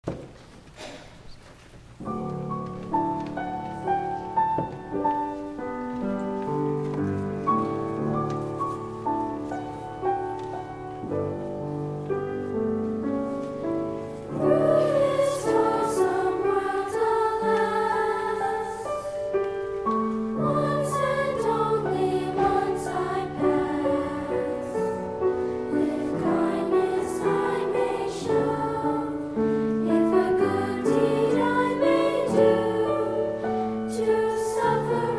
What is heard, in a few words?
Treble Choir